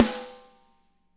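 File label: medium snare, natural kit; Spectrasonics; Burning Grooves CD2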